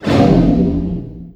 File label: slow; SPELLS